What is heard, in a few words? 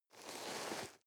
nylon leather soft